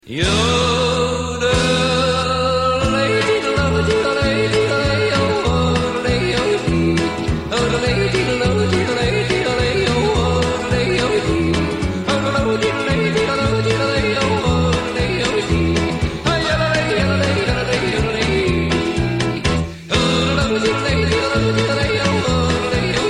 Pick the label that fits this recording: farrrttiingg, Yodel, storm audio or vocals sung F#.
Yodel